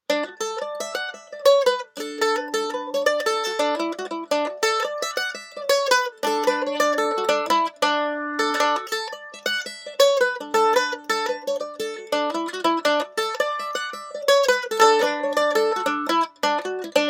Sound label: Mandolin Pick Comparison